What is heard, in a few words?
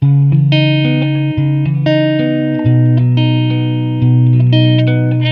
Guitar Ballad 90